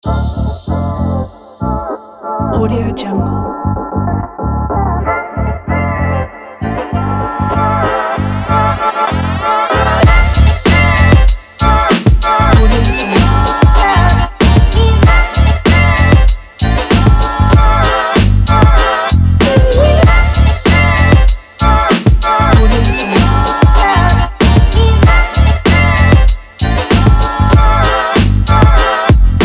sunny hiphop

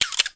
camera snap; soundmorph

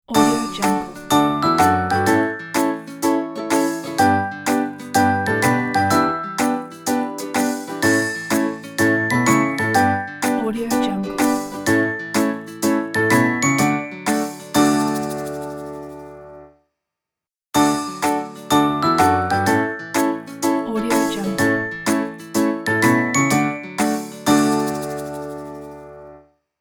happy day ident

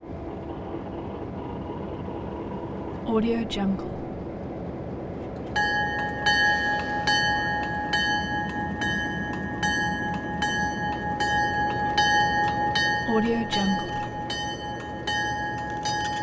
train diesel f7 idle approach bell ring

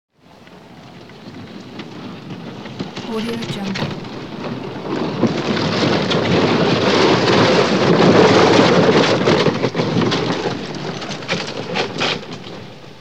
wood scrape dragging across pavement

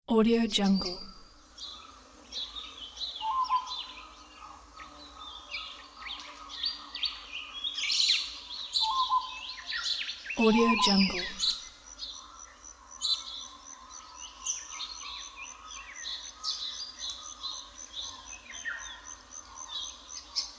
birds song

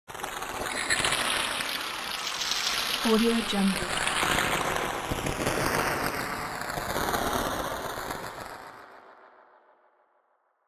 abstract noise water feature; smartsoundfx

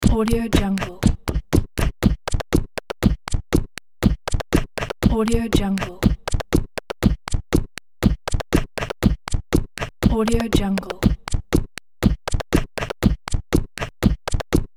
lofi game groove

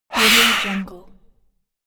short zombie air scream

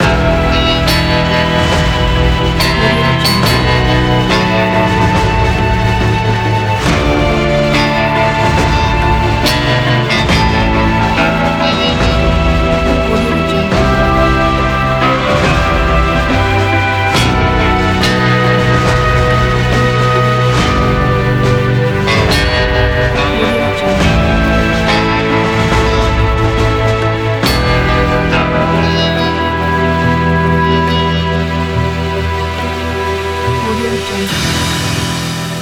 retro spaghetti western intro

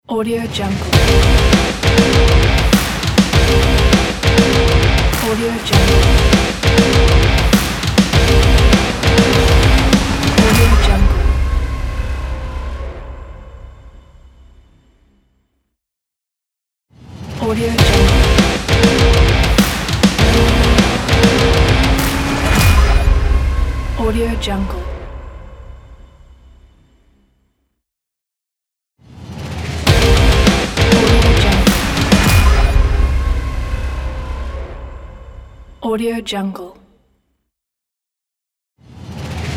rock logo

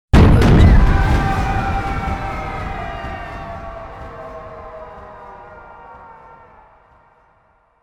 trailer hit people annihilation

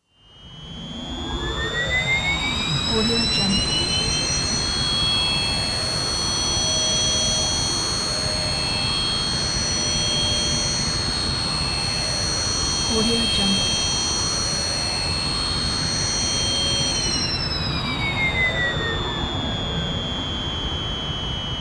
jet fly by synth wind up phasey blasts ringing; prosoundfx

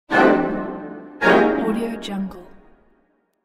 cinematic orchestral drama stabs; alexander-blu

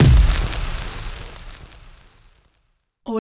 kick dirty; logistics innercircle02; soundmorph

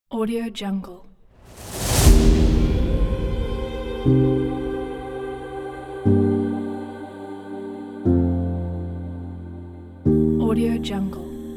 epic emotional orchestra

